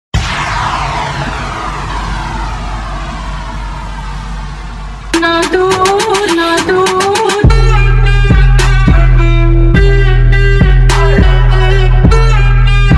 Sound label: slow bass remix